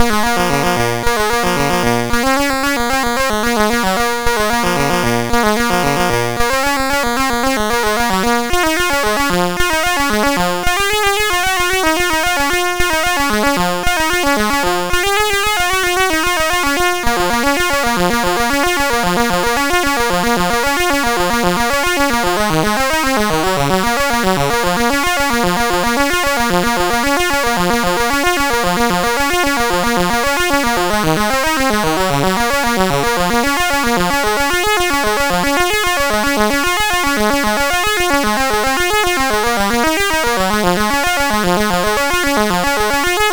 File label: sid MOS6581R2; Pingo